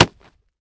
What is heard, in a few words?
wall brick; Golden Crayon Sounds